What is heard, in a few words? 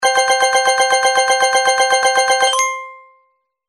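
coin roll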